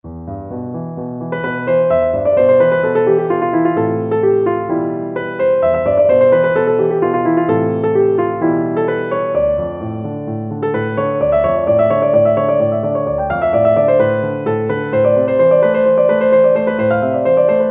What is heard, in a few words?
piano